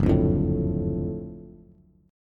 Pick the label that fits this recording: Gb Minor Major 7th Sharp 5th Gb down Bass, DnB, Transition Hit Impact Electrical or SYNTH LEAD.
Gb Minor Major 7th Sharp 5th Gb down Bass